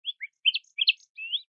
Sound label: natura game play; Spik